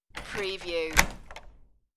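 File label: Door Lock Open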